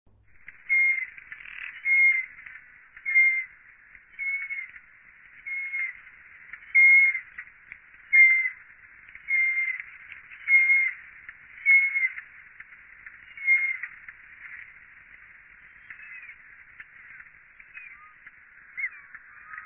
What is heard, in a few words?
Black-Crested Snake Eagle